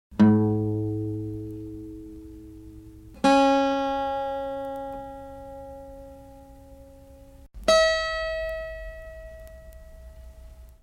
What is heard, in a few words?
cguitar, mellotron